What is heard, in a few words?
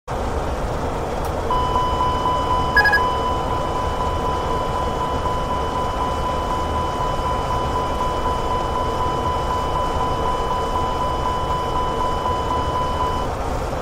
master caution airbus